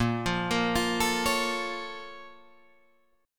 Bb Minor Major 7th Bb down Guitar Drop D, chord; BbmM7; arpeggiated; JGuitar

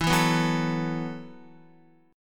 E Suspended 2nd Suspended 4th E, down, Guitar; strummed; JGuitar

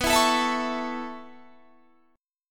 Db Minor 7th Sharp 5th B, chord

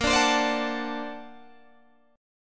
A sharp Augmented Major 9th Guitar Open G

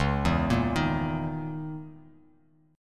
C sharp Minor 6th Add 9th C sharp; C#m6add9; arpeggiated; JGuitar

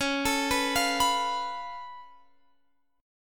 Db Augmented 7th Db down Guitar Open F; arpeggiated

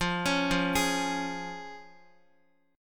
F Minor Sharp 5th, Guitar; arpeggiated; chordshape; x,x,5,6,5,4

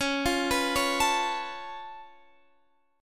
Db Augmented 7th Db down Guitar Standard; arpeggiated; JGuitar

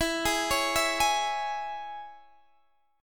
E 6th, Guitar, DADGAD

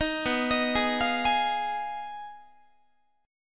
B 6th Flat 5th, down, Guitar; B6b5; arpeggiated; 18,19,18,18,21,19; 4A,4E,5A,5D,5G#,6C#

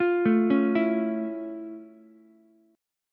F Augmented Major 7th F Ukulele; F+M7; arpeggiated

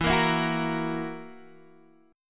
E Suspended 2nd Flat 5th E, Guitar, Drop D; 14,13,14,11,11,x; down

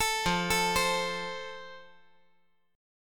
F Major

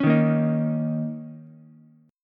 A sharp Minor Double Flat 5th A sharp, Ukulele; strummed; 6,3,6,6; JGuitar

